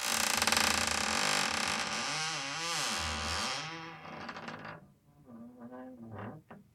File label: door loop; Golden Crayon Sounds